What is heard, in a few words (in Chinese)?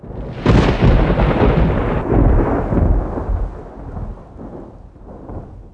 打雷